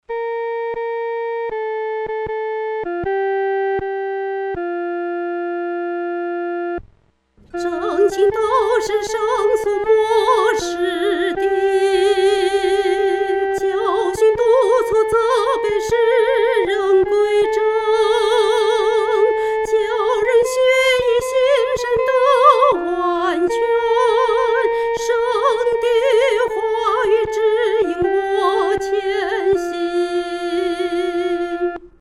独唱 第一声

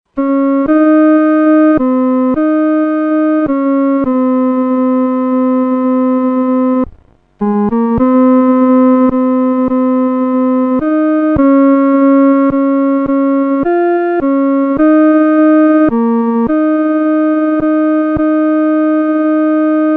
独奏 第二声; 岂能让主独背十架

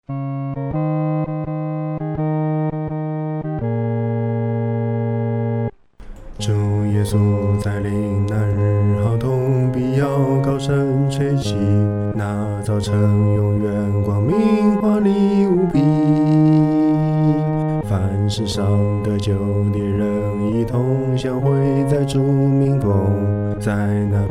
独唱 第四声

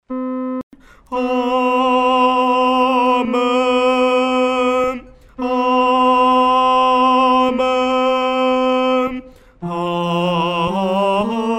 阿门颂 独唱（第三声）

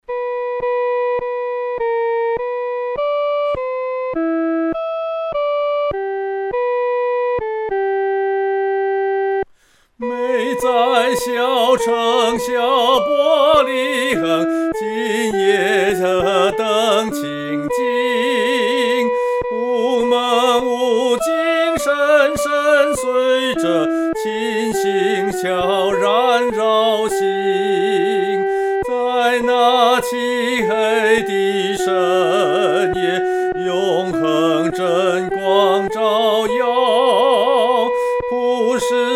小伯利恒 独唱（第一声）

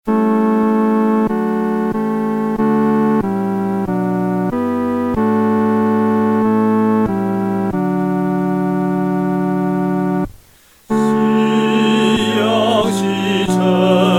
独唱（第三声）

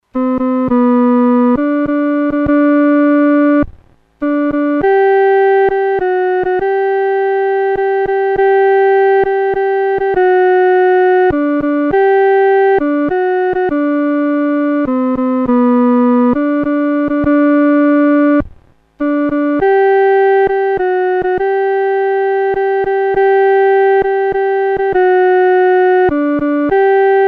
独奏 第二声

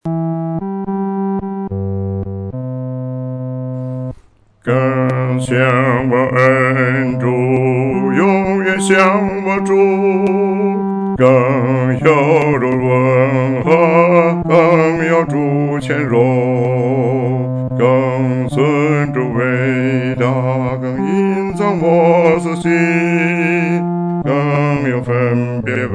独唱 第四声

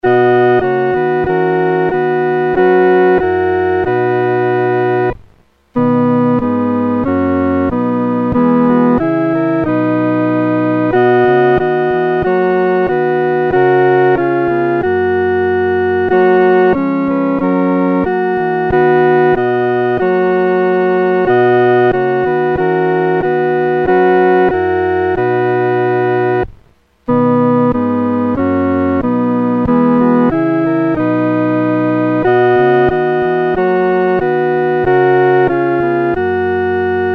独奏 第二声; 欢然颂主

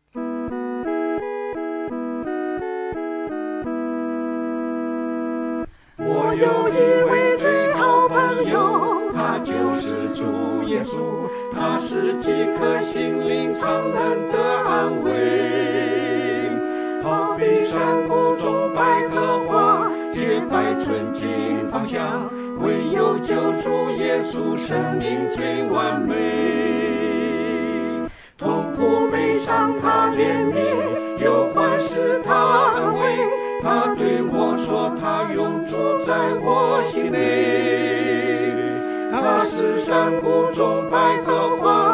合唱 四声部; 谷中百合花

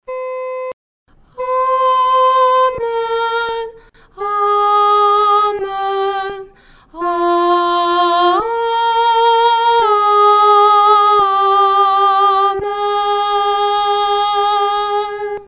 阿门颂 独唱（第一声）; 阿门颂（二）

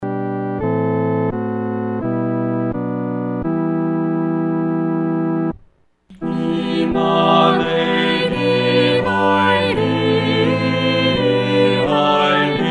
合唱 四声部; 以馬内利来临（一）

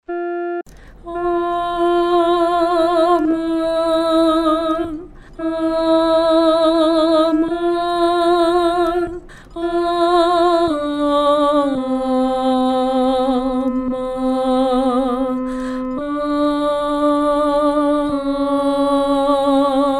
阿门颂 独唱（第二声）; 阿门颂（三）

